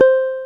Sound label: BREATH; MORPHEUS